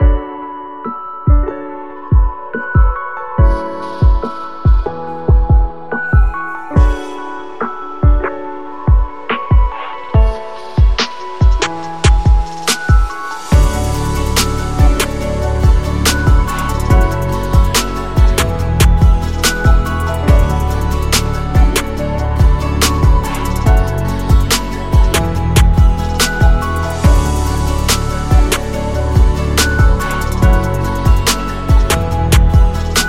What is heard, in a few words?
Beauty Vlog